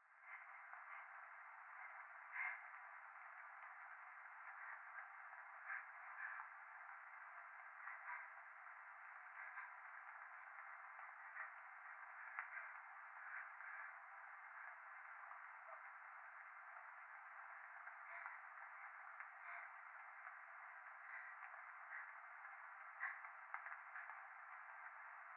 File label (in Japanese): 風呂 左耳快感吐息 雨